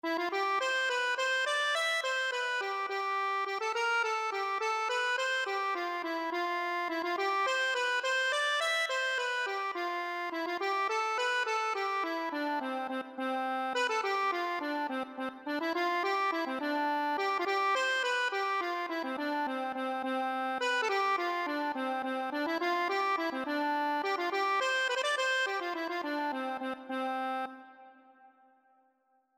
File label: accordion